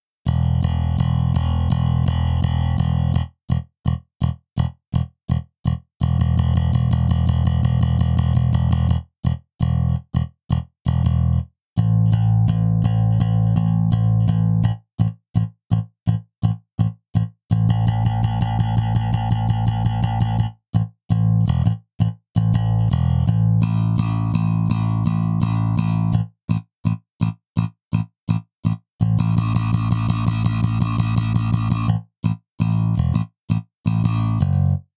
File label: Modern J Bass Defoult setting Pick